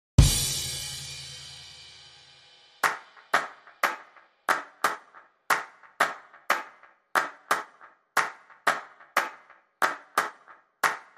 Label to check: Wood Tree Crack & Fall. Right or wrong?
Wrong